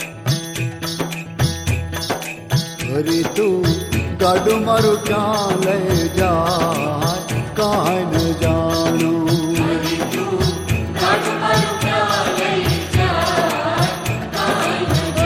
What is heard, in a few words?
Gujarati Song